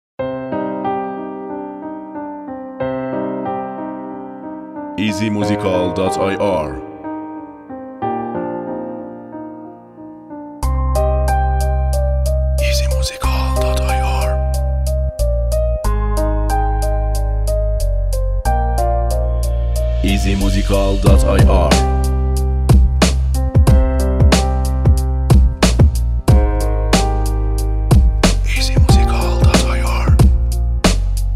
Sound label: Beat